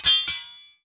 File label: metal, resource